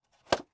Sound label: box grab department store type